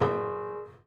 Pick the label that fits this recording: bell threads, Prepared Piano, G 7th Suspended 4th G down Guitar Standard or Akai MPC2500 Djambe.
Prepared Piano